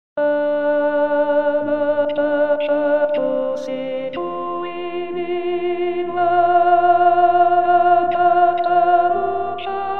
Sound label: ALTO; Hanacpachap